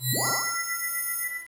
ALIEN PHONE, AMB

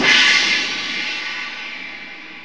SPLASH; Rubber Chicken Software Sample Bank; DRUMS + PERC

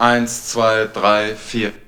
VOX GENERAL; Megabass Remix! 2

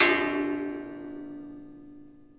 Springs, cartoon Foley Fx; musicradar; cartoon caper

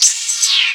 FX, 130, 2 Step; USB Soundscan vol.51; House Side Of 2 Step; AKAI

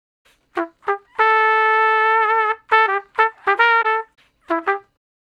Trumpet Straight (E); Solos

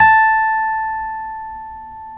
STEEL A, FOLK STEEL Guitars; Spectrasonics; Hans Zimmer Guitars VOL-1

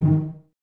CES D#3 Pizz, Cellos, String Ensembles; Miroslav Vitous

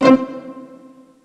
PIZZICATO, ENSONIQ VFX; Rubber Chicken Software Sample Bank

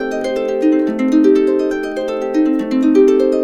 70, Complete Harps; USB Soundscan vol.62; AKAI; KUBLA 70

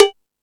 Ambient Rock, drum machine

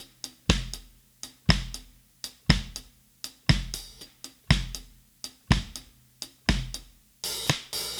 Drums, 70s Analog Funk

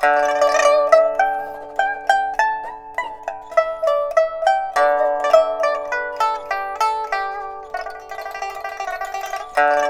PIPA, 90; INIS - Opium

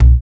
African Perc; Spectrasonics; Supreme Beats; African Contemporary; SB Afro DRMs

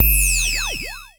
Klone Dual-Percussion-Synthesiser; EFX

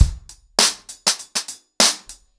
100BPM, ACOUSTIC DRUMS

